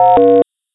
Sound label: general alert